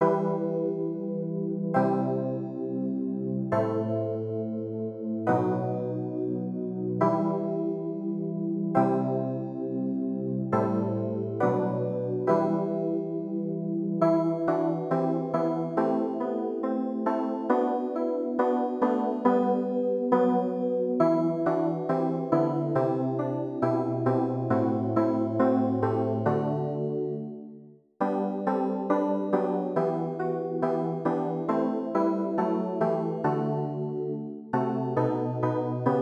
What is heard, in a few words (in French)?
CLAVIERS